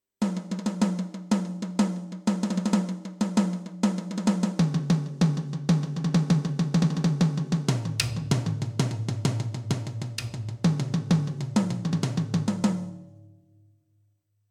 CommandKit Toms

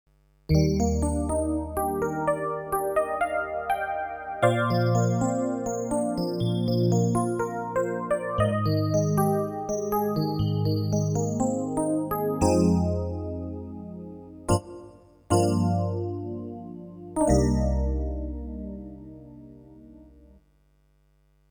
S650 E Pianos

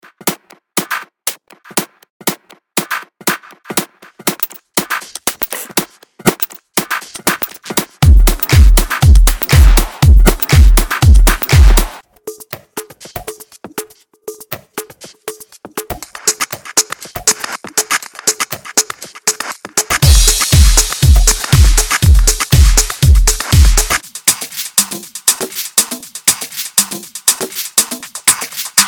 Kick Free Main; Mutekki

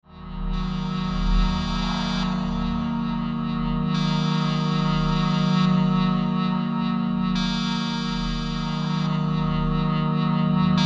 jd800 lost continent

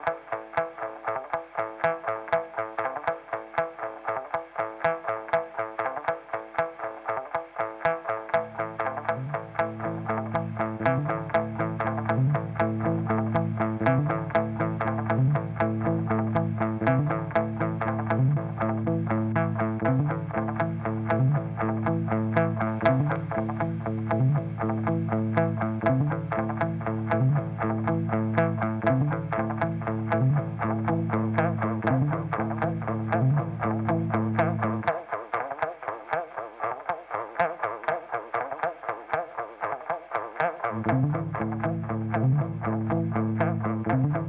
Timeline Lo Fi; Strymon

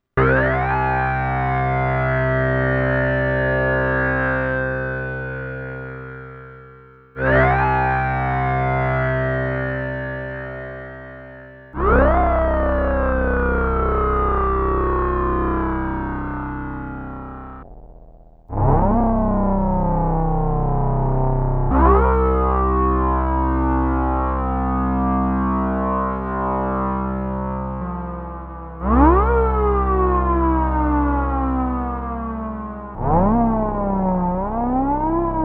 MP 02 Freq Mod X Mod Sync

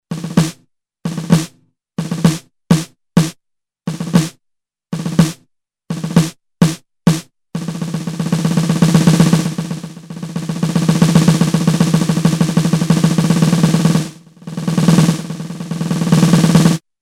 Articulation

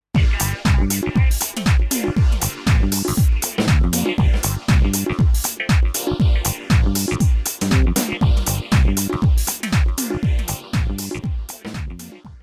Electribe SX House

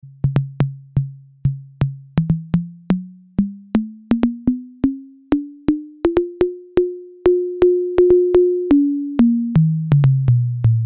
MODOR DR2 TENOR DRUM engine

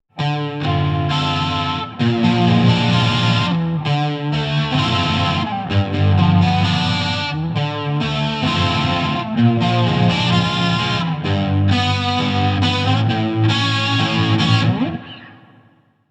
Fender Bandmaster Chorus

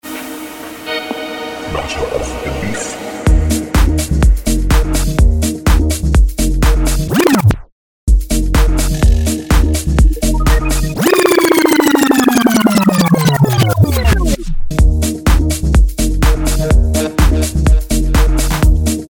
Release FX Backspin

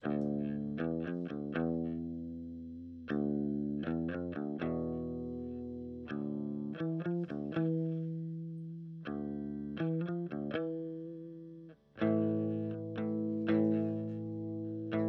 Evil Filter Fuzz und Filter Loop

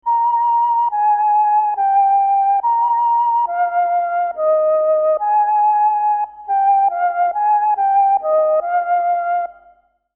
Flute

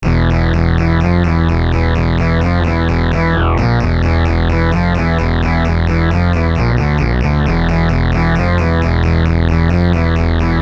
Preset EHX Mini

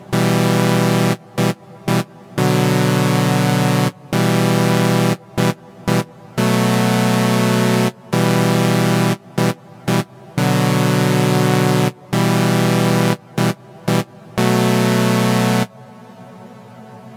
Keys smart reverb Infinite

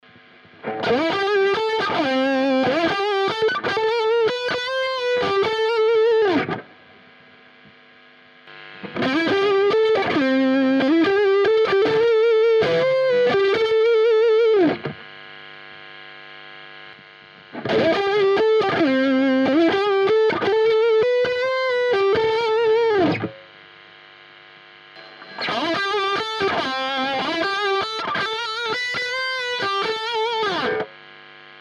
Lead Raw Marshall; JAG-STANG

